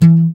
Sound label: GUI AC, GUI Acoust. Slap; Sound & Vision; Gigapack I CD 2; GUI ACOUSTIC 2